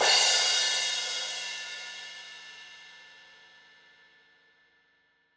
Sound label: Crash Crack, Cymbal, Drums; One Shots; Ableton Live 12 Lite; Core Library